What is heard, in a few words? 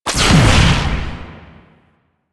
Amp Charged Shot, Weapons; Warframe; Operators; Amplifiers; Drifter